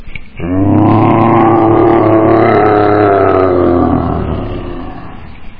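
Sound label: bear roar very close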